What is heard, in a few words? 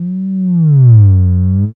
bass G, Talking Bass, Dance Synths